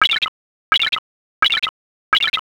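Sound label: footsteps bush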